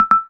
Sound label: Notification; eTrex Touch 2; Proximity Alarms